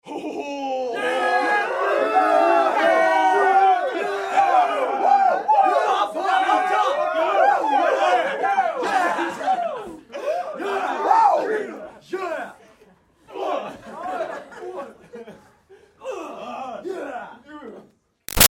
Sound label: Crowd Teens Boys Party Reactions Whoa; Crowds